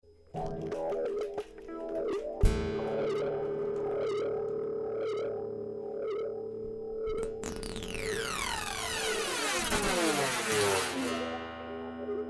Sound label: Vocals Electric Guitar; Tunnel Light Mirage